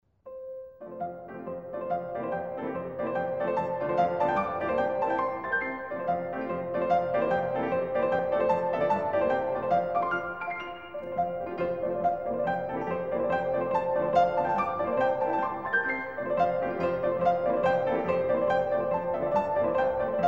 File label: Opus 25 Etude in F Major; Chopin; The Complete Etudes